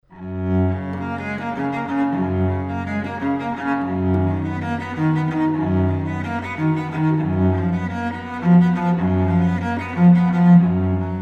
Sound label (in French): violoncelle extrait